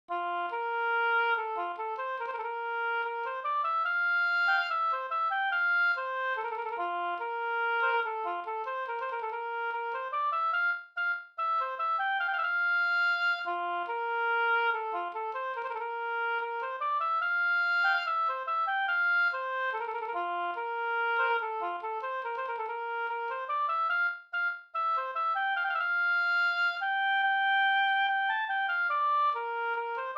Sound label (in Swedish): brudmarsch; e Gardin; Valbo